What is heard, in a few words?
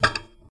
Radio Band Switch